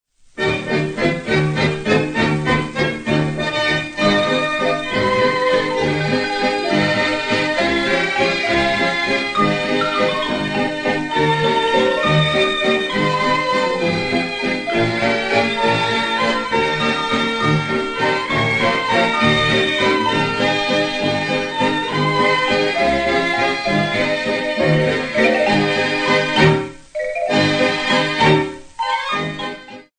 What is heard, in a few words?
daisy bell